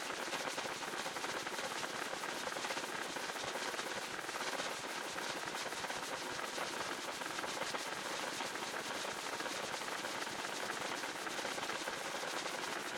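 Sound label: Player Fall Wind Instant-Photo-Storm; viewfinder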